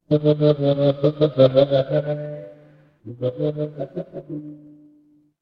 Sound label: fire electric arc